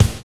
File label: DANCE SET, DRUMS